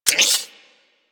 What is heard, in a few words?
Multi Gun Overcharged Fire; Enemies; Abilities; Arachnoid Wraith; Warframe; Corpus